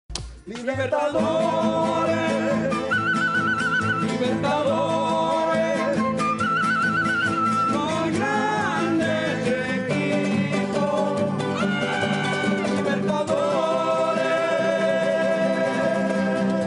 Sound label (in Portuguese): Vinheta; Libertadores